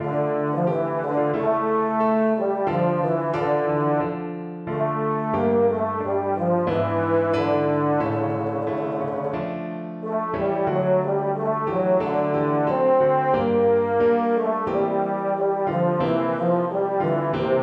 EUPH, euphonium

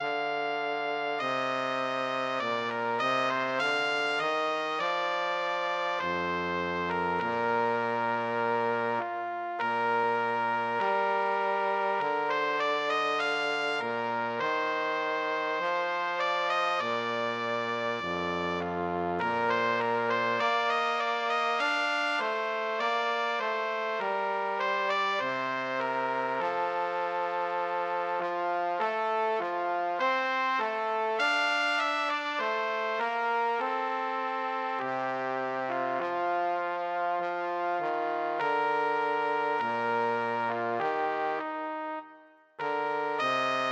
rule britannia, trumpet trombone duet

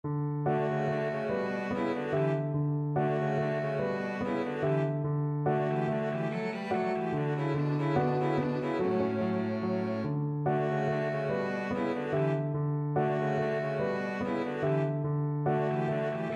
violin cello duet

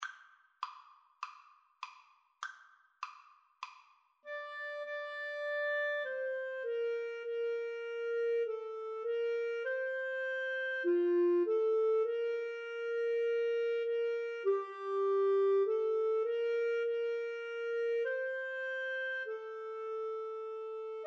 Flute Clarinet Duet

